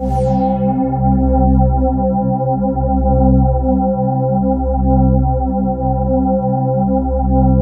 beauty, ethereal atmosphere

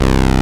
SYN MC MIN, Roland MC-202; Sound & Vision - Gigapack I CD 2

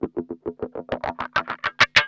GUI, LICKS

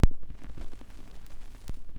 SCRCH NOZ, Record Noise; AKAI S-Series CD-ROM Sound Library VOL-4

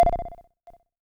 bleep, Korg Electribe ER-1; Kit 15

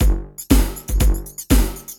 CB LOOP; Zero-G; Chemical Beats

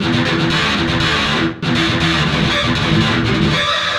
GTR LOOPS

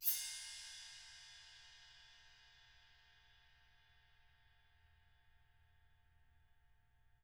RIDE SWELL; RIDE 20

